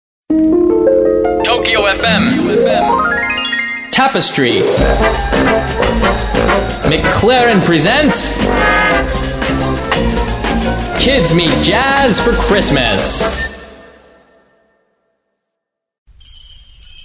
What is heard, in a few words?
jingle